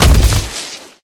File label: hardwood fall; Golden Crayon Sounds